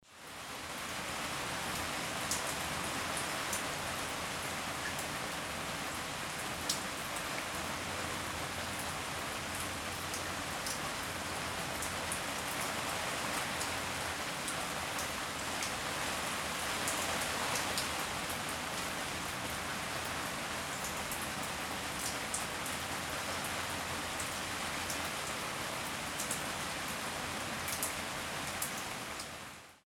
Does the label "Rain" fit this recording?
Yes